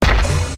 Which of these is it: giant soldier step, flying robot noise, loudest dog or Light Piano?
giant soldier step